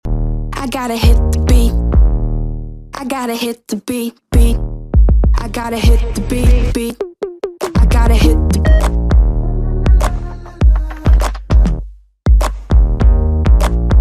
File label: music